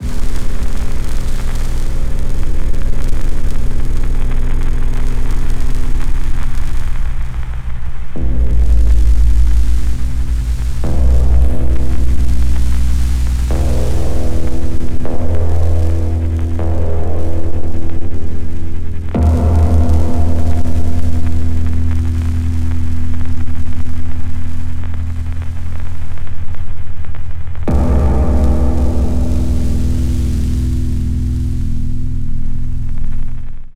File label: texture grimdark, drone pad texture